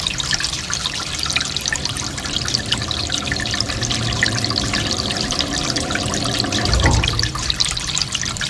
sewer drain; Golden Crayon Sounds

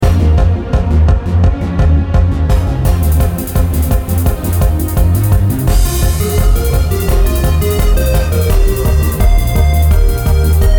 mythic battle; Manamon Music; Manamon 2

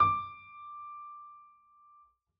piano d; Golden Crayon Sounds

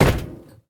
metal step; Golden Crayon Sounds

